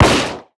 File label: gun fire; ddnet